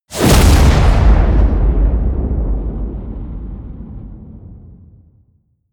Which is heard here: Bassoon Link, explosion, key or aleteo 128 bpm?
explosion